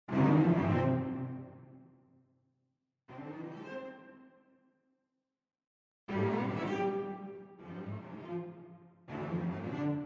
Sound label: String Ensemble